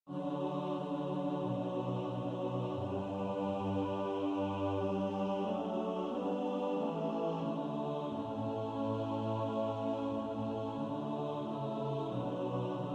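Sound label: alto, practice music, SATB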